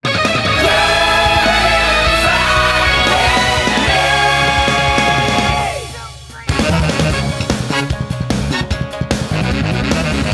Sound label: Stage Clear Intro; Jitsu Squad; ps4 ps5 switch windows xbox one xbox series xs